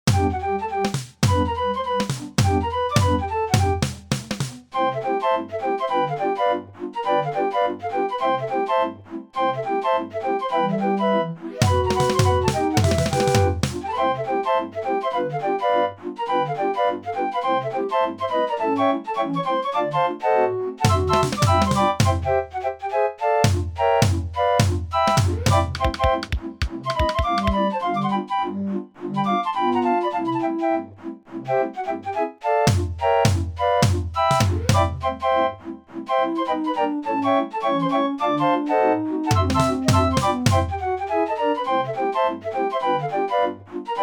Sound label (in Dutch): Dixieland, draaiorgels